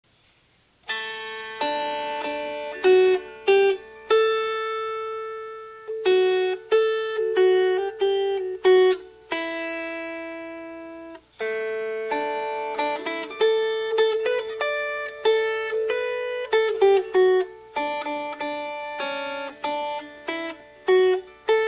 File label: Melody